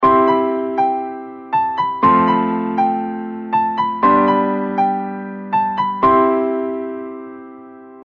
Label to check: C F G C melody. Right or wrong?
Right